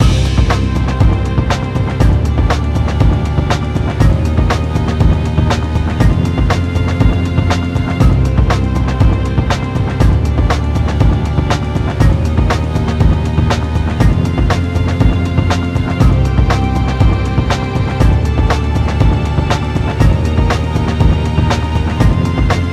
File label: music boss; soldiersfury